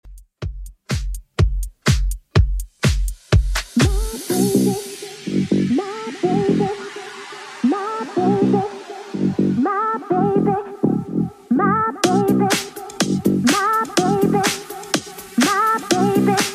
80s Revibe Redrum 124bpm

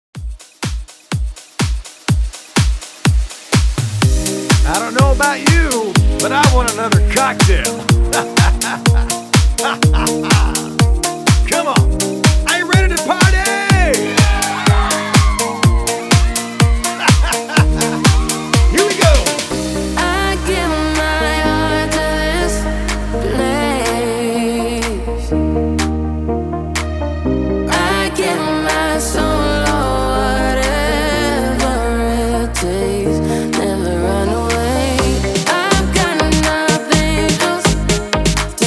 HYPE 124 BPM

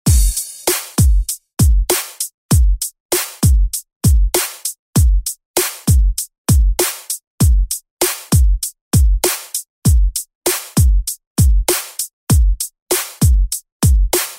Party Hype; Deejay Hit; Redrum

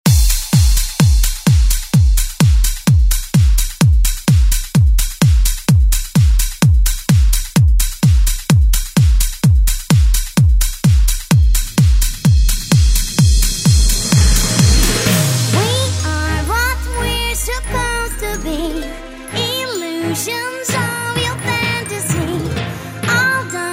90s ReDrum; DJ Mhark

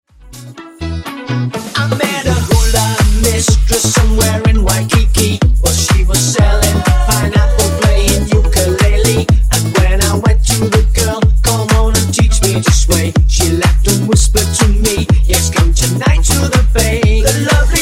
Disko Funk Remix 124 Bpm

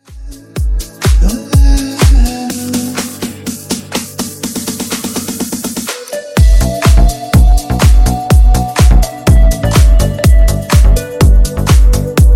Funky Remix 124Bpm

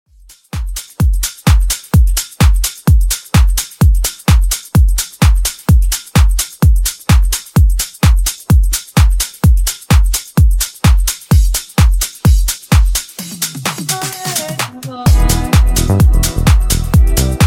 Dj Intro Outro Remix 128bpm

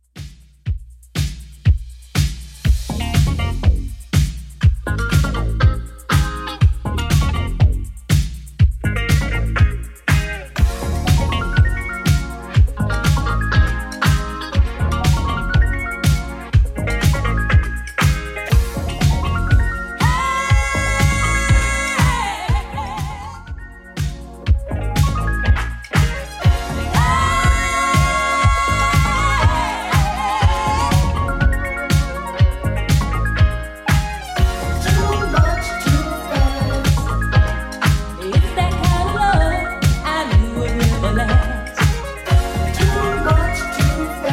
80s Redrum 121bpm

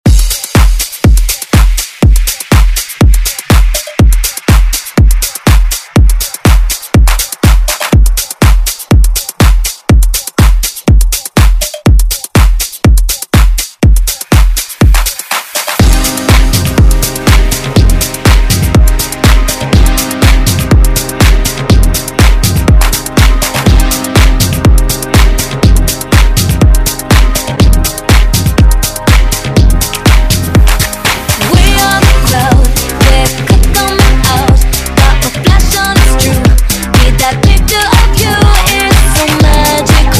Dance Ext Redrum

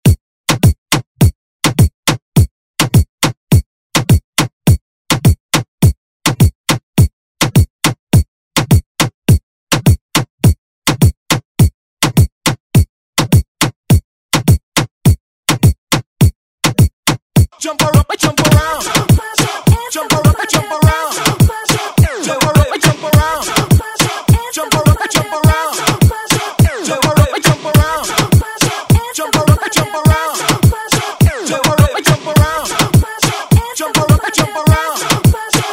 Hype Redrum

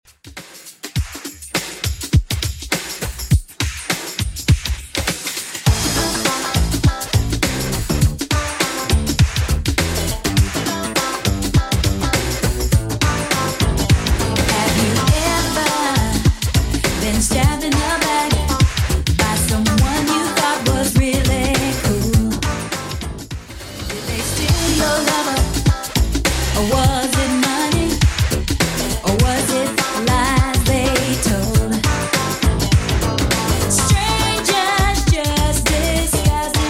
80s Redrum 102bpm

